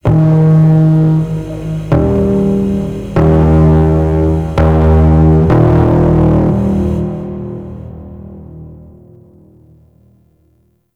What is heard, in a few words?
Stage Bass Guitar; Warframe; Ambience; Nineteen Ninety Nine; Obj Temple Defense